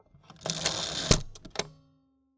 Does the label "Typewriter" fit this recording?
Yes